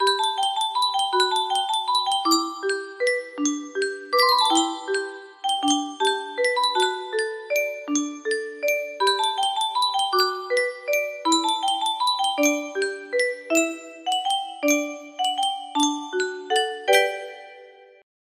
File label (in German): yunsheng spieluhr